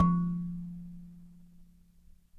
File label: Dyna Sansa; Roland LCDP11 Africa VOL-1; Sansas